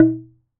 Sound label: Wooden Bar Strike